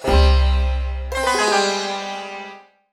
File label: Death Jingle